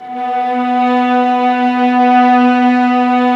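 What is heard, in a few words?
violas, ambience, piano; String Master Series; Vlas Sect3-4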